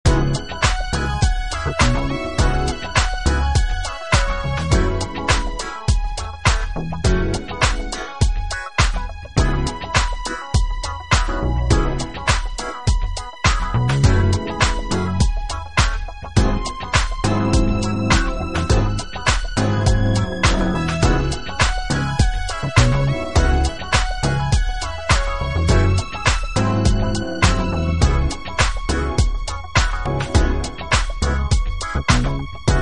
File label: Theme Music